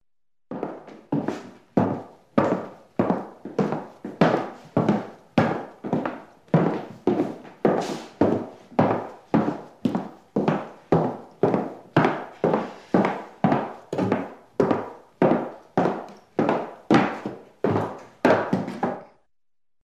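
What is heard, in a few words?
footsteps on wooden floor of stairs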